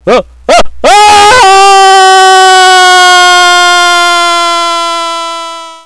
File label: fall